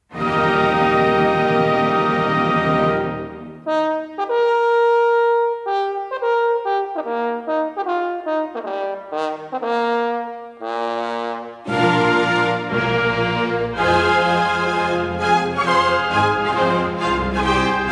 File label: Horn Concerto; R Strauss